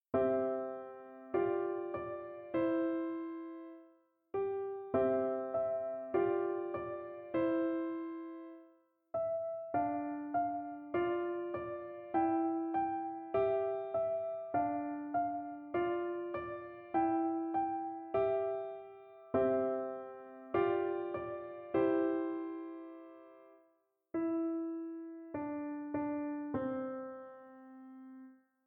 Easy Piano

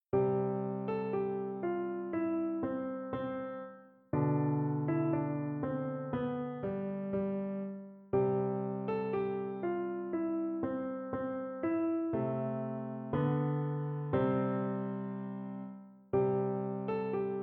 Easy Piano

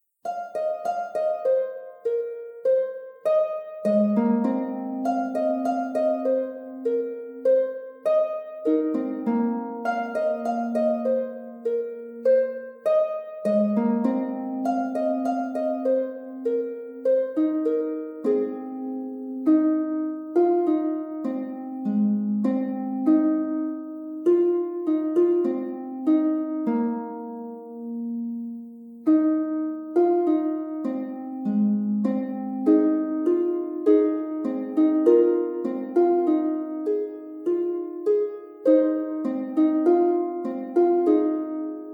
Little Harp Pieces Bubbling Brook

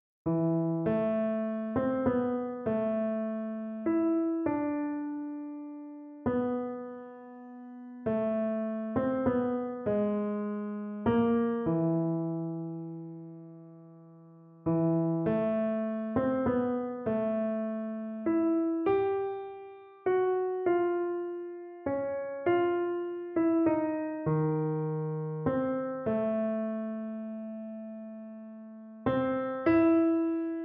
Arrangement for Very Easy Piano in A minor; Harry Potter

